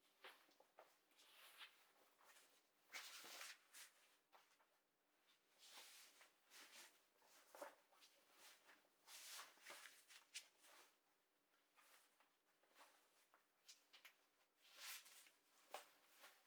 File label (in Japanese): 上着 を 着る